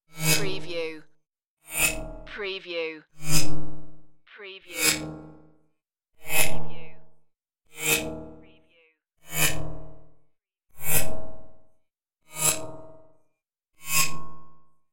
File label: tiny space flybys